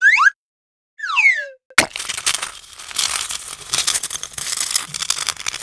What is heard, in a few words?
taunt rare; weapons; dota; phoenix